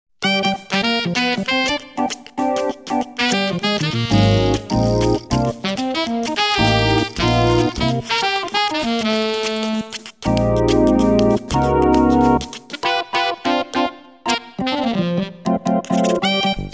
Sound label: micro jazz